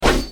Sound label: spear